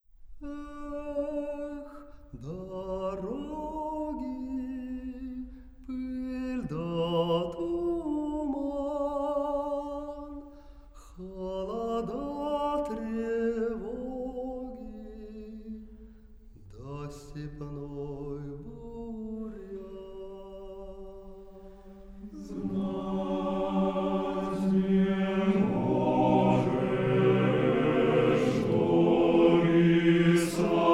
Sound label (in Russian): Хор Валаамского монастыря